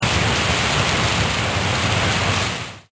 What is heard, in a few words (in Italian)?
pioggia